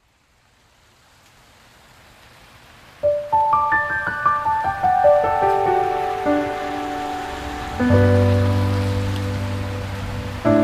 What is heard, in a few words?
Piano and Rain